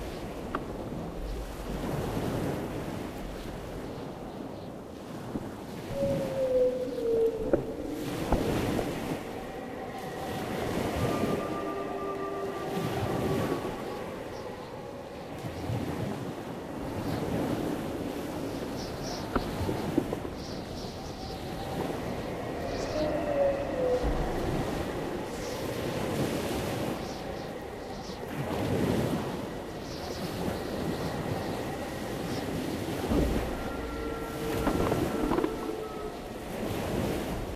Christmas Ambience

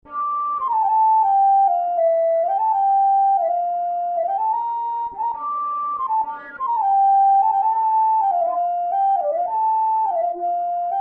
Flute

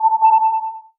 Search Burst

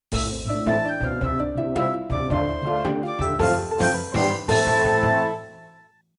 Thanksgiving event jingle

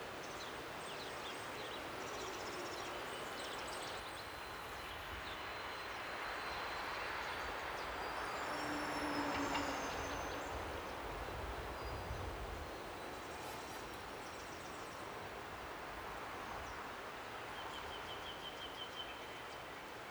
city loop spring

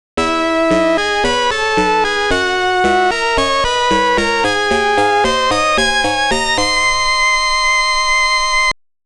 RETURN IN TRIUMPH (STAGE CLEAR)

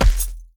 Footsteps Dirt Run; The Town Sam; Devour 2021 gamerip pc macos

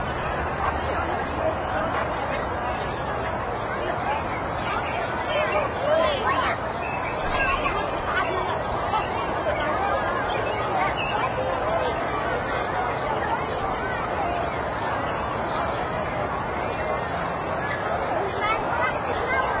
Zen Palace Ambience

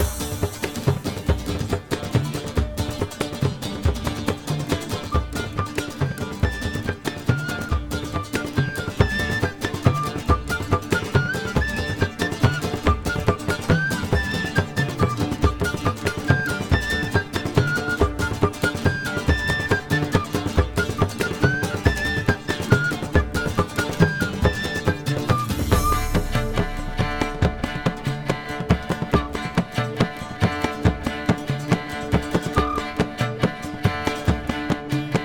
tavern; The Witcher 3 Wild Hunt; Novigrad

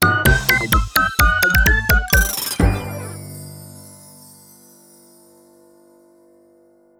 Ending Fanfare; Toybox Tools

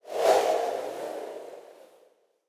Ui Trans To Main Screen Wind; portal quest android

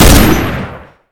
Ambient Sound; Synthetik Legion Rising

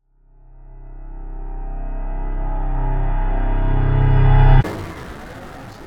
boss intro 120bpm; 12beats; chicory a colorful tale; ps4

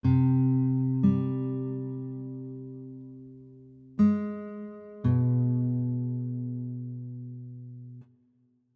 spookiertown bgm 120bpm Cmin; 16beats; chicory a colorful tale; ps4

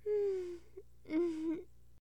Massage; SFX Bathroom; Hilzartov; Fairy Size Doesn't Matter

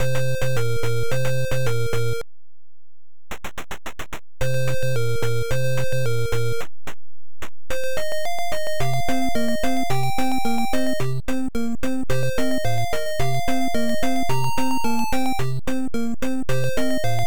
Tavern Theme; Advanced Dungeons & Dragons; Pool of Radiance; NES

